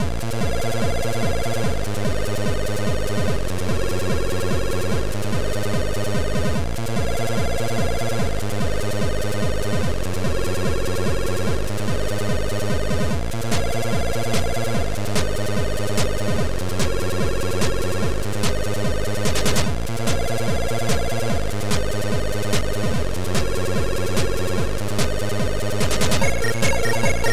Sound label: Buzz